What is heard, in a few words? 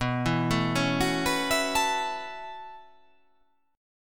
B 9th Suspended 4th B down